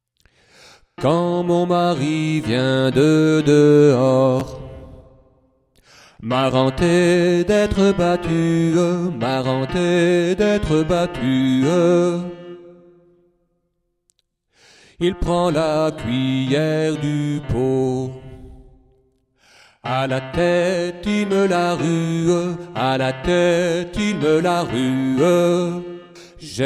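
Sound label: bass; Quand mon mary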